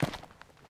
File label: ash step; Golden Crayon Sounds